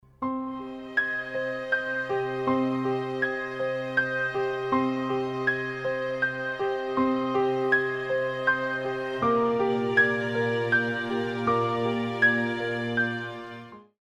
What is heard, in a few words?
lost round; music kits custom